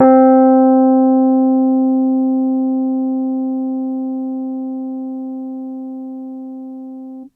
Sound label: Piano Rhodes c3 med